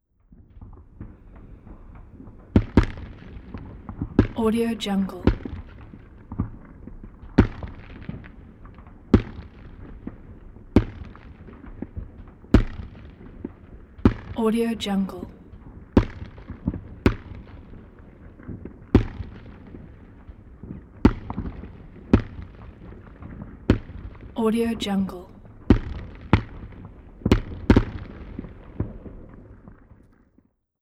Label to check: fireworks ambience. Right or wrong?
Right